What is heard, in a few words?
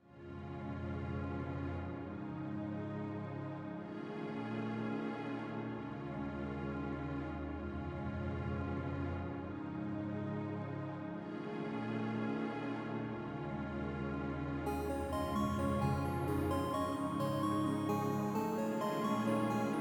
Simple Noises